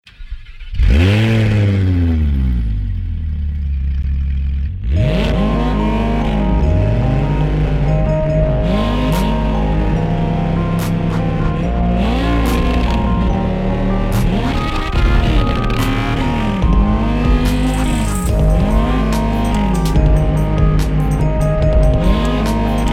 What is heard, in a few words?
jingle bells